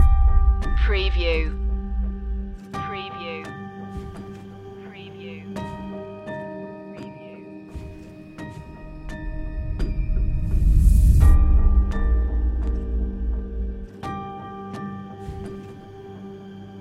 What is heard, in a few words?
thriller trailer loop